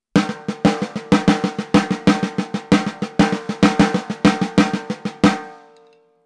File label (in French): Caisse claire clave